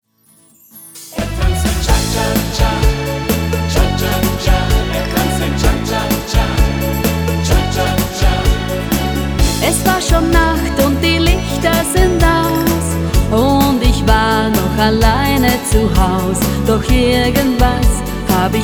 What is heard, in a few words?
Cha Cha Cha